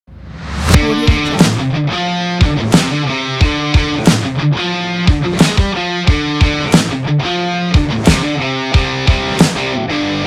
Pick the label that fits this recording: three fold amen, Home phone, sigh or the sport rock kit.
the sport rock kit